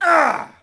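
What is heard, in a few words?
Axeman Hit Vox; Civ4 warlords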